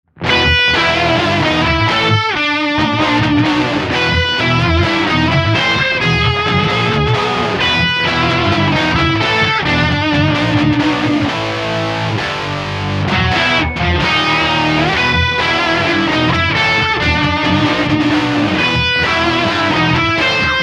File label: Heavy Guitar; sessionguitar; Session Guitarist